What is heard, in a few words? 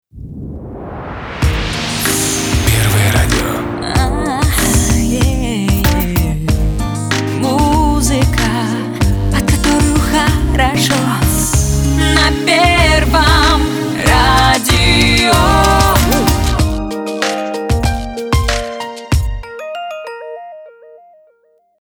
radio jingle